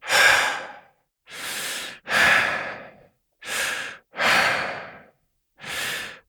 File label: Breath Heavy Slow Adult; New War; Adult Operator